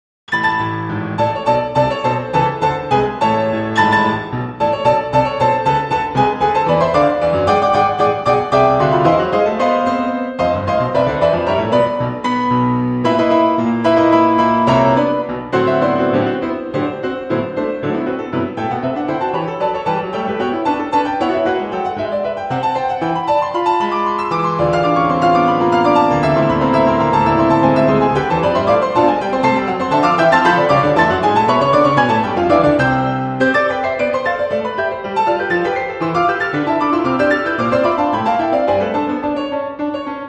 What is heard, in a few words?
Solo Piano, Allegro; Concerto for Piano and Wind Instruments; from Mov. I; Stravinsky